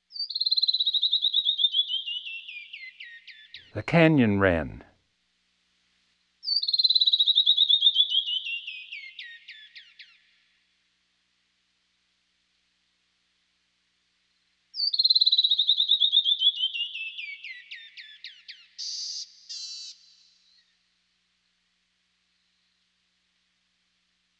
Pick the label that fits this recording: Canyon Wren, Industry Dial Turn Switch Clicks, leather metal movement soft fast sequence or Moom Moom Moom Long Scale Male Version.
Canyon Wren